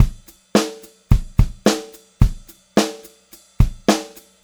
POP VARI, Pop-Rock Drumloops; 108 POP

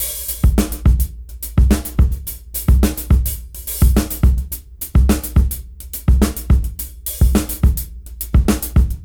Free Latin 105 BPM; AMG - Keith LeBlanc Phat Butt Jams

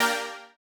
Housey Stab A#3; KG MegaSaur

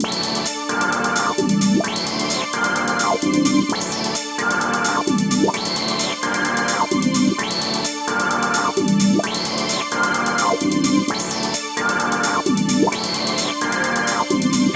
In Groove 130 Amin, hardcore breakbeat; Big Fish Audio - Hardcore Breakbeat Action; Hardcore Breakbeat Action CD1

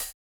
Cl Hat, 140bpm, Grime; Zero-G Sound Sense Grime Scene; Construction Kits; Its Primetime

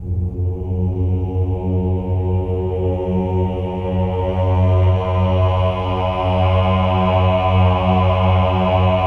OH-AH F#2, choir; Spectrasonics; Symphony of Voices

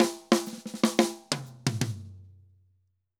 Drum Break 120; Drums & Percussion; Festa Latina; Best Service; Festa Latina VOL-1

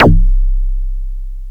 blips, opl3

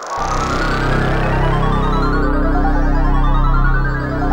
SYN JD800; Best Service Gigapack 1

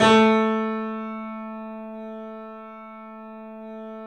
The Piano; InVision Interactive - The Piano

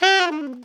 ALTO SRT FL G 3, alto sax; Giga Samples Collection; ALTO SHTFALL

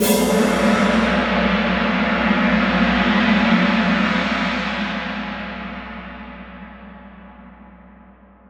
Crash, impact samples

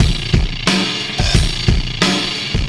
System Overload Beat, Processed Drumkits

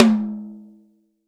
TOM, Real Drum Kit, Rock Kit

